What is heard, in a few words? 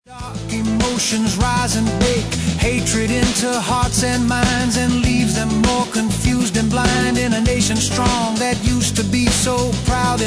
Blues Folk